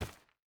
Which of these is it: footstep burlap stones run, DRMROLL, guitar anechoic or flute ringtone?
footstep burlap stones run